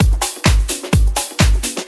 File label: Latin Loop 128 BPM; House; Best Service; House Definition VOL-1